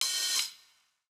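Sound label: open hi-hat; eddyflux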